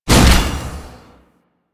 Vault Door Open End; Spy Vault; Ambience; Warframe; Corpus Gas City; Gameplay Remaster

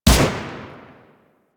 Emplacement Shot; Grineer

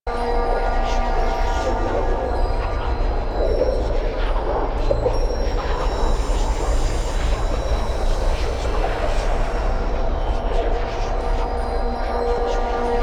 Shades Loop; Enemies; Deco Shades Loop; Warframe; Warframes; Pax Duviricus

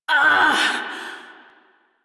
pain, female; Railjack Crew; Steel Meridian

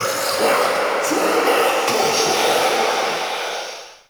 Mockery Male Vocal Death; Characters; Enemy; Vocals; Mockeries

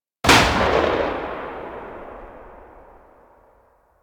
Flak Cannon Tail; Grineer

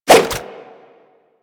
Nunchaku End Whoosh; Warframe; Tenno

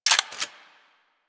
Hand Shotgun 2D Mech, Weapons

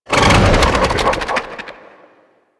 Scarab Swarm Release; Warframe; Warframes; Mummy

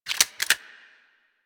Grenade Launcher Reload; Warframe; Weapons; Corpus

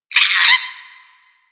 Forest Creature Squawky High; Ambience; Warframe; Grineer Forest

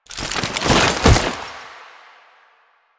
Prone To Stand, Foley; Lasrian Lancer; Warframe; Lasrian Soldiers; Stance

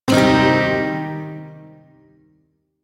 Shawzin Min Chord; Warframe; Duviri Shawzin

